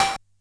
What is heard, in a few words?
Bent Hat; glitch; Bent Hits